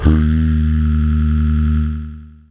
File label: Vox C4, Humans; Humans1